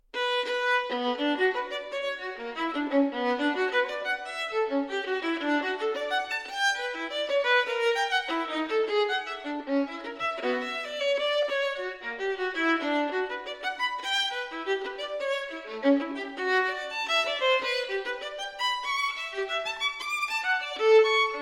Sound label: violin partita in b minor corrente; violin partita no. 1; bwv 1002 iii